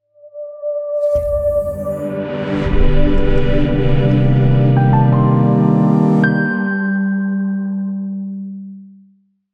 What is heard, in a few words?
JINGLE; HAVAS VIDELIO